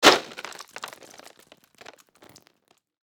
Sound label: Blood or gore splatter plastic surface